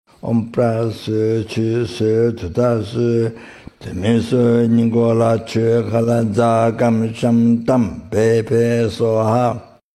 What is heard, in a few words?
Black Manjushri Mantra